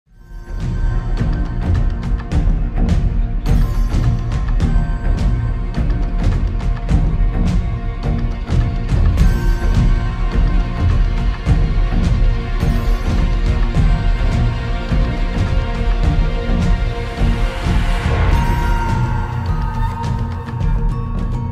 Grassland Battle Music; ARK Survival Evolved

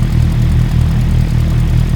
Vw Golf 8 Idle; car simulator 2

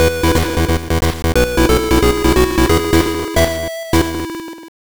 Race Start; Toy Story Racer; GBC

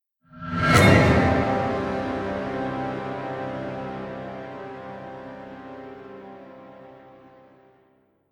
Stinger Drama; Dishonored

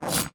Skill Slash; Magic Shrek

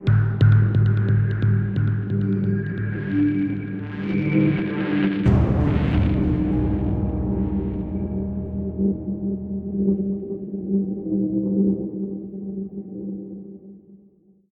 Tubular Stinger; Scourge Outbreak

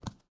Walk; portal quest android; Paladin

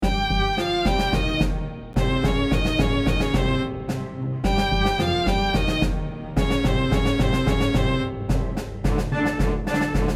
Orchestra; dragon fantasy book i the volumes of westeria

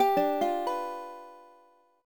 Guitar Strum; the legend of zelda phantom hourglass gamerip; Freedle

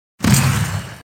Boom Sound Monster Dead

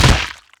Fruit Breaking

